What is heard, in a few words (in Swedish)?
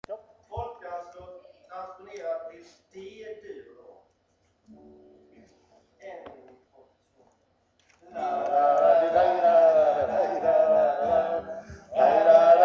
Folkdanslat D-dur Melodistamma; Ronneby Folkteater